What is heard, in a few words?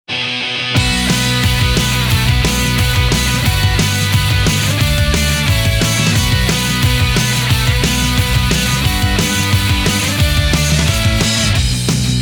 Instrumental; Gintama